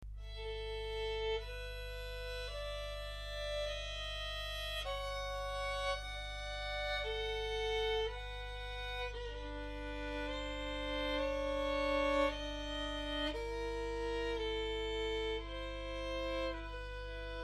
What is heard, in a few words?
slur, violin; viggiano